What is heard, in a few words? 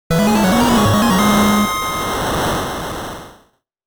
Goal; Game Sound Museum Famicom; Sports Series; Baseball; Tennis; Golf; Ice Hockey